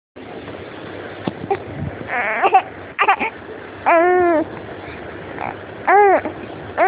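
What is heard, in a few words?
baby hungry, male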